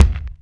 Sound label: Kick, Alesis DM5; Drums